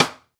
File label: rich top end snare single hit b key